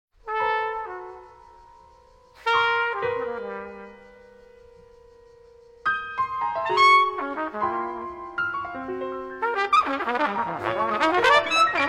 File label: Quartet Intro